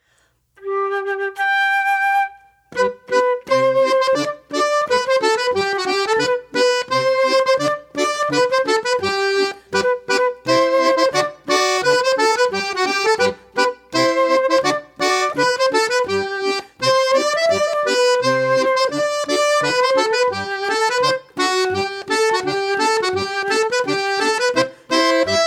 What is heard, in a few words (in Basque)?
FLAUTA AKORDEOIA Katalina; JOKOA